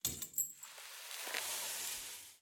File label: Blacksmith Hammer